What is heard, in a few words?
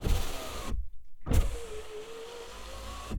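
car passenger window open close slide nissan note exterior; sonniss gdc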